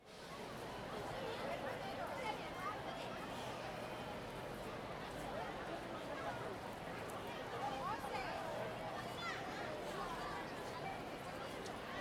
Ambience, City, Dense Crowd Walking and Chattering in Comercial Pedestrian Big Street, No Traffic; Sonik Sound Library; Spatial Crowds